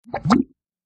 Bubble Pop Wet Hiccup; bubbles

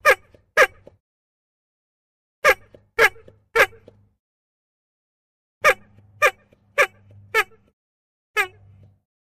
Model T Bulb Horn Honk, ford model t

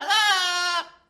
Parrot Talks

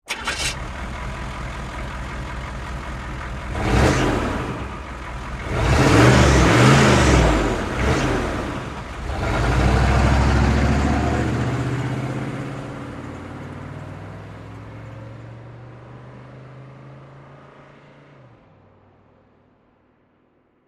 Humvee Slow Start Rev

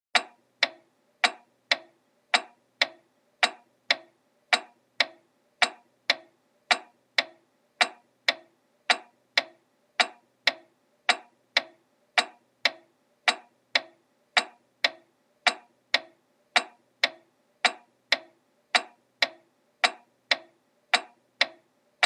Clocks Pendulum Tick, pendulum

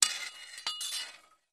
Pick Up Sword Grab; swords

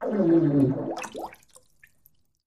Bathtub Fart Bubbl; farts

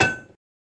Metal Hit Anvil

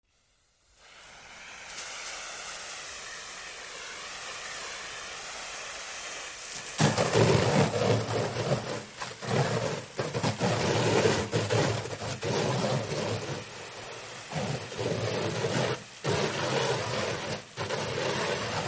Fire Hose Sprayed, fire truck

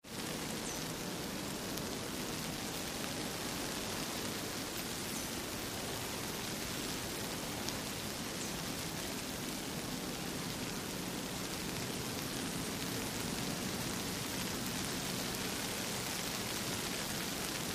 Light Rain Shower Lea

